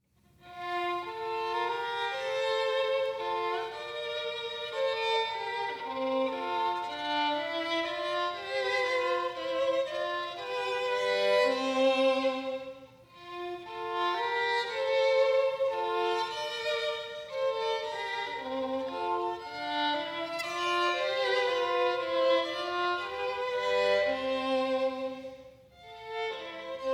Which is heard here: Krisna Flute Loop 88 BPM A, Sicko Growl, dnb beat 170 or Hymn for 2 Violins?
Hymn for 2 Violins